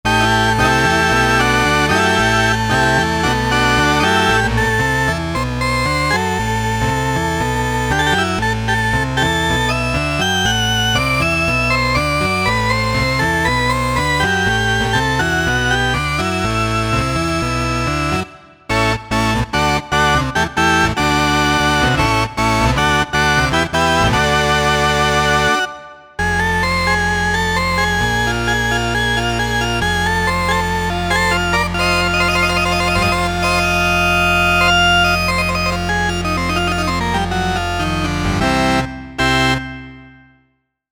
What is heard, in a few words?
60s Organ